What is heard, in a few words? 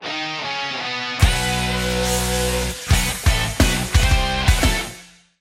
Riff; Aveyard Riff Next Gen